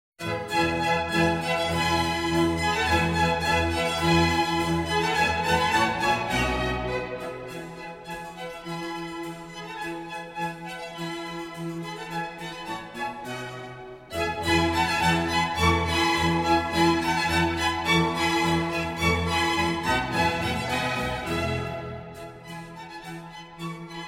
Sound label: Violin Concerto in E major, Allegro; Op. 8 Violin Concerto No. 1 in E major, RV 269, La Primavera. I. Allegro